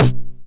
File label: 909 SD Tape Sat